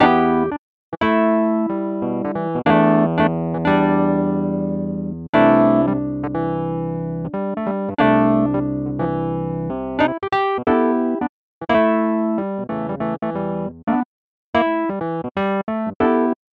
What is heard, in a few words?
Wurlitzer